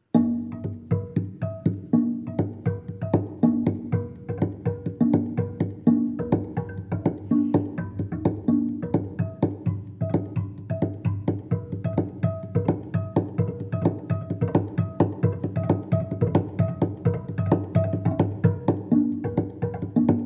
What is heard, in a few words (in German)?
reisemusik tambura